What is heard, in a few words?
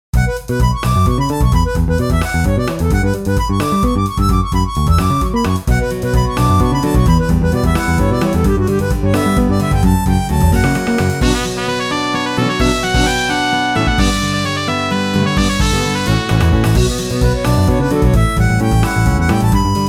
broken Steam